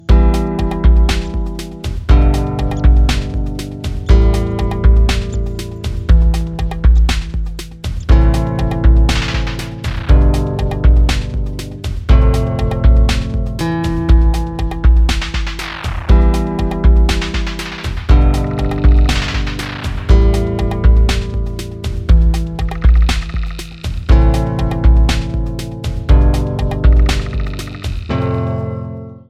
old sad love ringtone

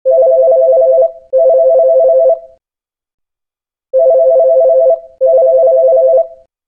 normal phone ringtone

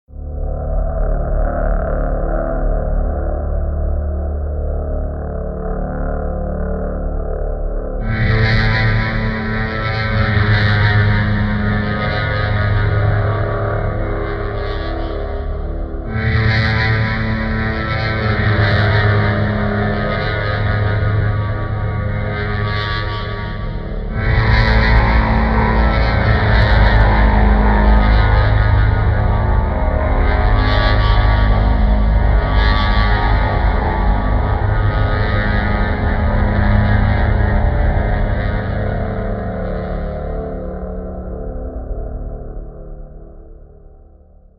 dark stinger ringtone

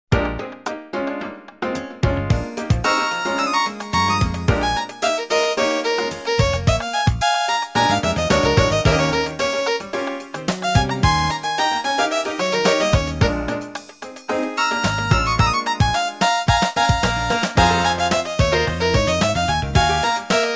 blues mania

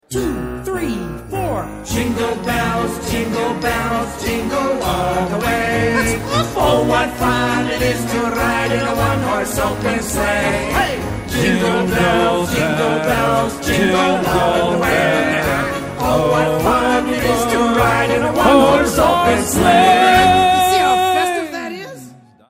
jingle bells ringtone

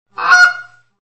goose call ringtone

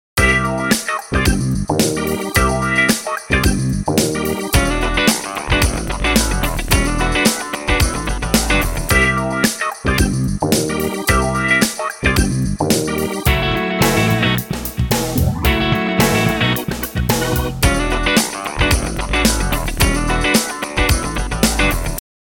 friendship music ringtone

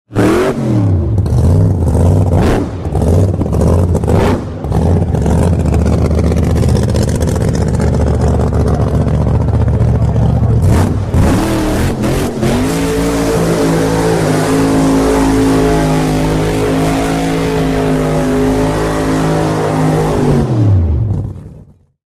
supercharger

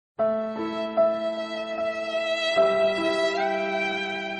Sad Violin Sound Effect Notification

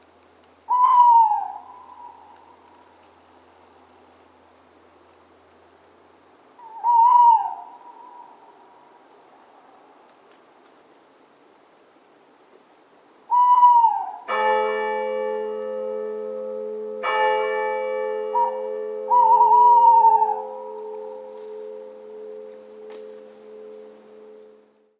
owl church bell ringtone

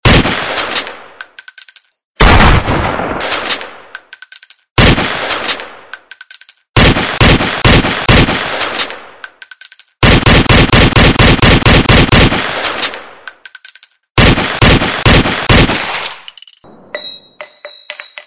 gunshot sound effect

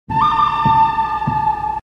western whistle sound effect

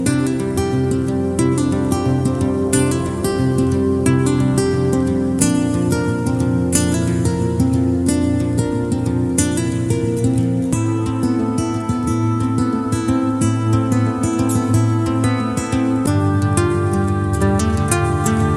calm guitar music

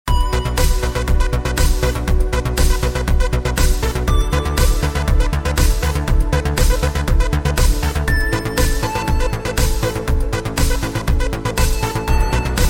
samsung ringtone; neon

